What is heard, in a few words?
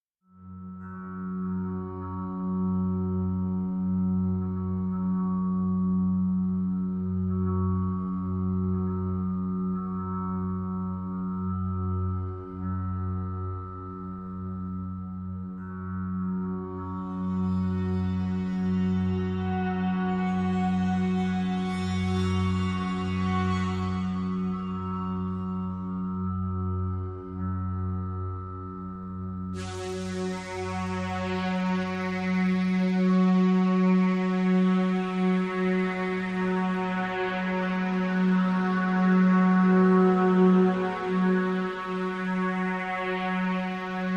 Drone, Light